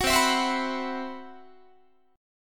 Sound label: C Major 7th Flat 5th, Guitar, chord; CM7b5 strummed